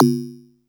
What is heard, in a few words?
Sine Perc